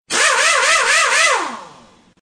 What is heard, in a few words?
car engine sms